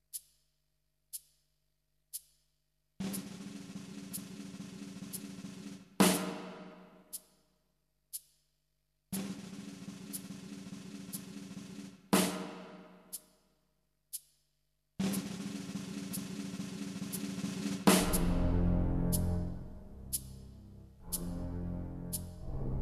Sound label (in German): paukenschlag